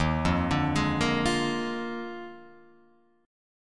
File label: Eb Major 7th Suspended 2nd Suspended 4th down Guitar Open F; arpeggiated; EbM7sus2sus4